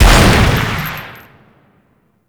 Shotgun Shot; Warframe; Weapons; Veilbreaker; Kahl

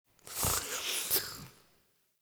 Idle Lick Snort; Ambience; Tagfer; Warframe; Entrati

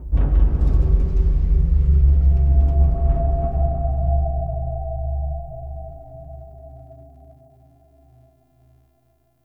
Lfe Metallic Boom; Warframe; Entrati; Dante Unbound Leverian

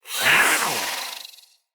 Catbrow Feral Vocal Attack Medium; Enemies

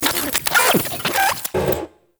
Venus Robo Fish Large Caught; Warframe

